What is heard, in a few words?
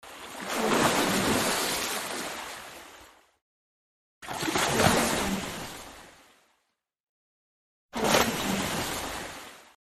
water splash; desmondsyner